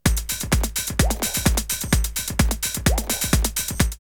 drumloops Rave 128; X-Static Goldmine; 90 s Sample CDs

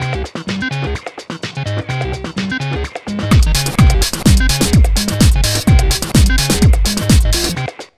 127 all 05 R, Fresh Disco House; USB Soundscan vol.20; AKAI

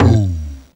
"BOOOM", VOCAL

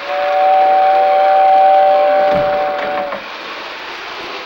trainwhistle; Sonic Foundry (Sony Creative Software); Ugly Remnants VOL-2; UR 2 FX (Long)

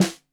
Snare 65 Reg Stereo, Deeply Sampled Drums

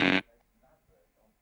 Tom Hi Speaker, Stylophone Beatbox

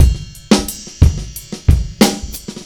JZ 90 DRUM, ACID JAZZ; Best Service; Advanced Media Trax Modern Composer

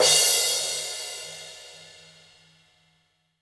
Gretsch Cymbal Crash; 1967 Gretsch Kit; One-Shots; Sonic Foundry (Sony Creative Software); Vital Drums

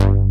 OBX BASS; Pro-Rec - Synthsonic; BASSES

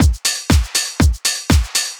4 floor beat, trance

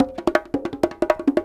Perc Loops, Drum&Bass 165; Joy; EZRollers

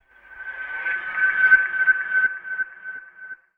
FX Why, Drum & Bass; Sonic Foundry (Sony Creative Software); Knutrix Drum & Bass

